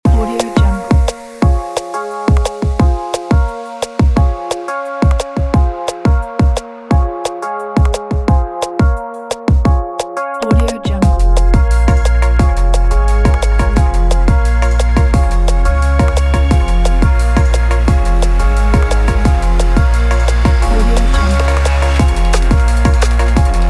sport action drum and bass

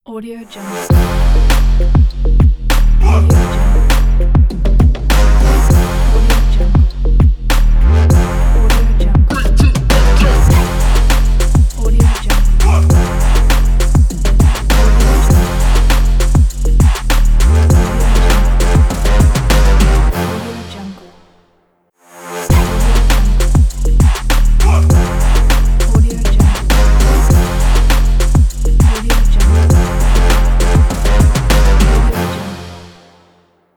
power trap logo ident

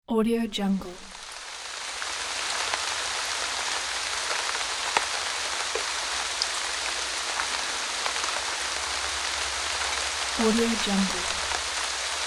soft rain

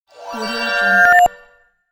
shimmer riser

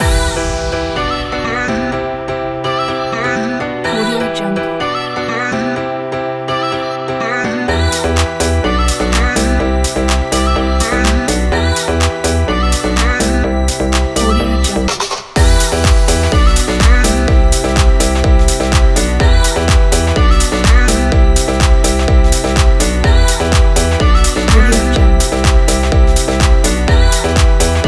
deep house

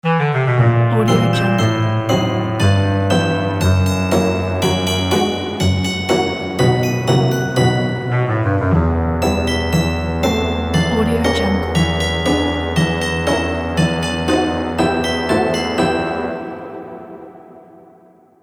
christmas commercial ident

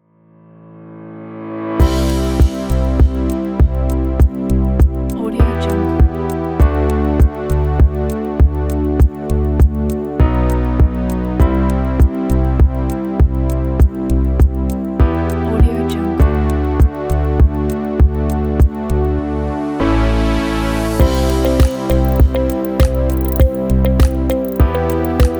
ambient space relaxing

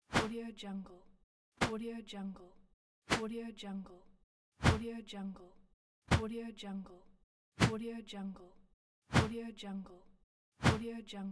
wind woosh

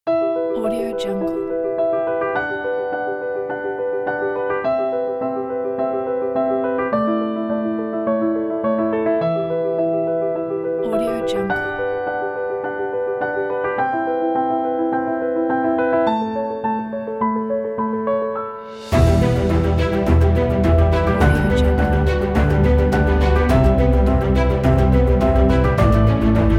an emotional piano

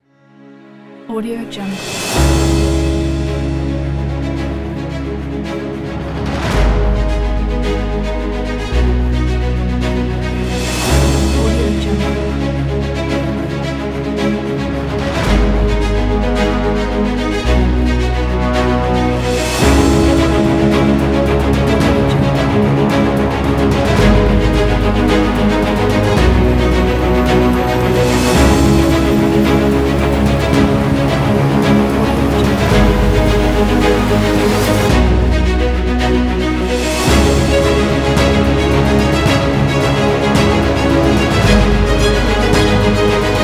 action epic

